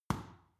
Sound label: boxing paw hit soft; boxen